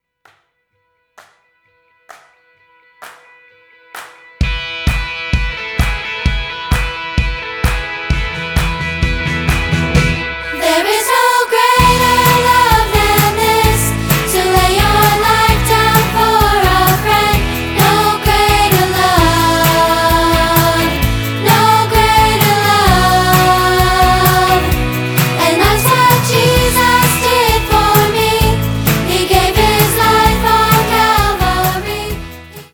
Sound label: Band